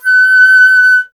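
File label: flute F#; Miroslav Vitous; Solo Instruments VOL-2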